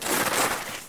Ski Turn; Misc Sports

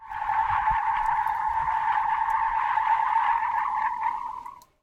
skid asphalt; Blind Drive

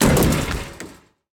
Bodyfall Hollow Metal; SFX Kit; Bodyfalls

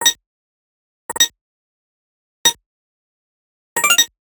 Arcade Click Positive; boom library; The Interface

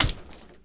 Blood Splat; SFX Kit; Foley - Misc

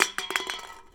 Hit Soda Can Drop; SFX Kit; Foley - Misc

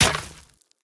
gun miss; Blind Drive

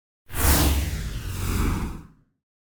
Whoosh Big Air Double; Hollywood Edge; sound designer's toolkit v2

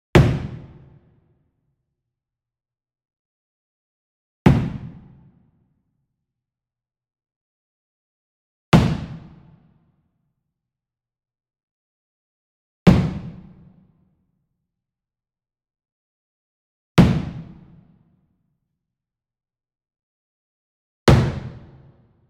Sweetener Wood Mallet Single Mid Hit Medium; boom library; Cinematic Strikes Construction Kit

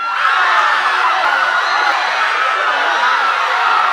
Crowd Panic Scream Loop; Crowds

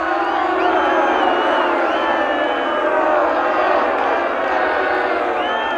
BMX Crowd Boo Loop; Crowds

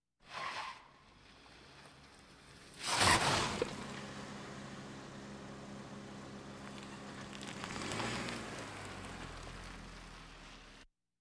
Automobiles various

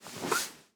Stool Cushion; Medical; Blastwave

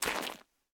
zombie footstep mud; DeadFright

